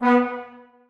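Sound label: trombones staccato a#3; Sonatina Symphonic Orchestra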